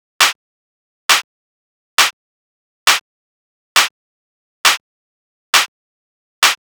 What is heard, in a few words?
Clap Loop 135, Techno